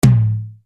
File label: LOW TOM, Yamaha RY-30